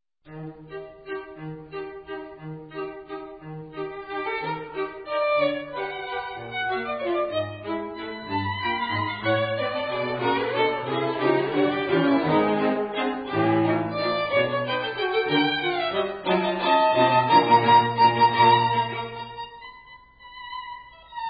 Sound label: string quartet